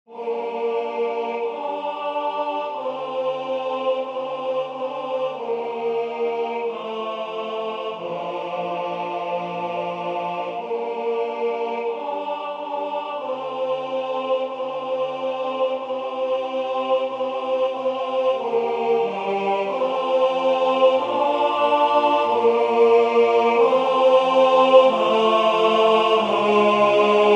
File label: tenor; Totus tuus